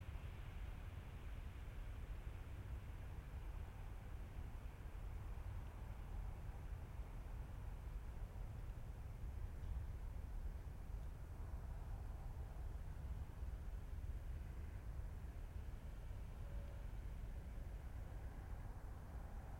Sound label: yamanakako sound